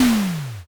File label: rock crush